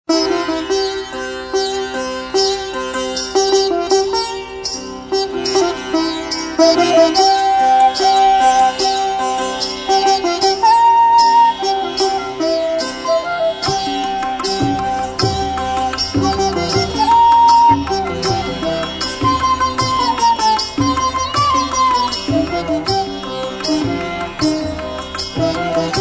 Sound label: INSTRUMENTAL